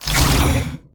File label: Monster Medusa type Attack; Inotia 3